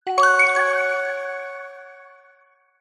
multimedia game sound kids warm mellow positive complete